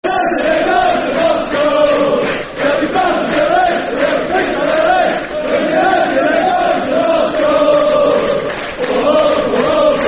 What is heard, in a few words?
Football Chant (Soccer Song), Man United; United Are Going To Moscow